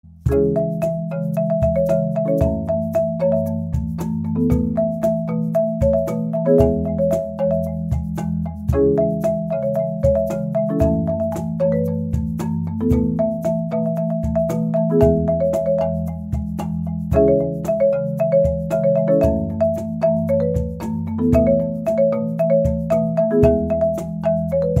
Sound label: Marimba